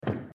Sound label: jump wall